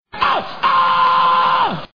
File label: Oh Oooh Male Scream